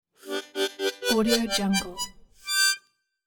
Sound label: ascending harmonica finale chord